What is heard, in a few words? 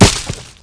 bush; Mafie 1